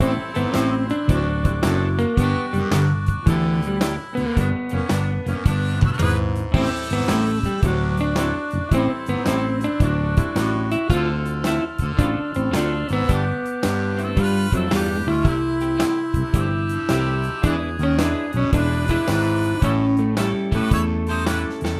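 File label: music ball game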